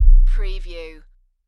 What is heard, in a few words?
LFE Hit